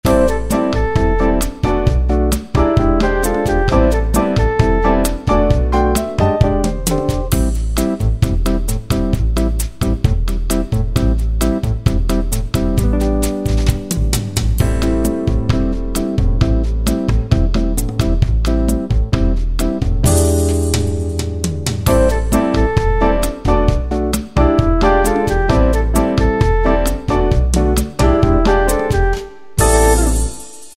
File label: Bossa Nova, Yamaha QY70 Preset Styles